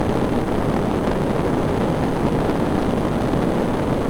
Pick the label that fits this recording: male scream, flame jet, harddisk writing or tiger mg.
flame jet